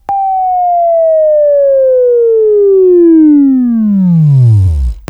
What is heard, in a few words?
Buzz; Sweeping Training